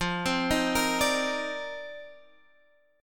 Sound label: F 13th Suspended 4th; F13sus4; arpeggiated